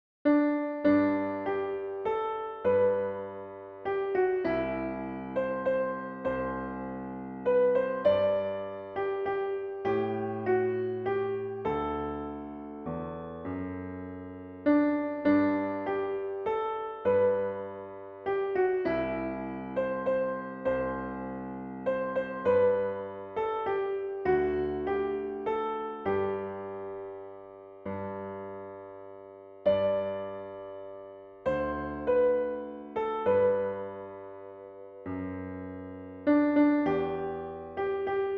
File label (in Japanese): 峠の我が家（ピアノ）